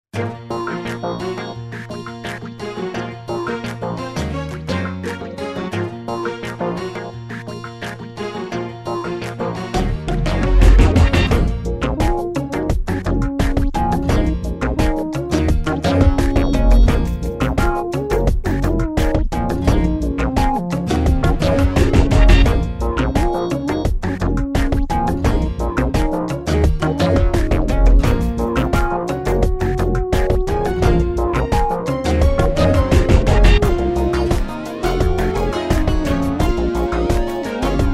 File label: XV2020, jazzrock, synth